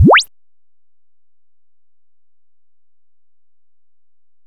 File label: jump; DuckRun